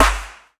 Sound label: smooth bottom end snare sound g key; snare drum samples